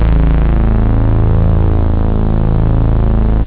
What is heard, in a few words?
juno, synth bass